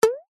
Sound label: cartoon ping twang; zapsplat